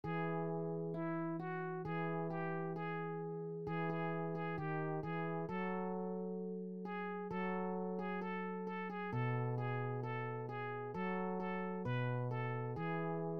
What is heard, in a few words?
Fight Song Melody